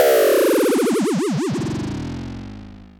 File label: Klone Dual Percussion Synthesiser FX